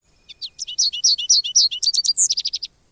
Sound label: SONG, Hawaii birds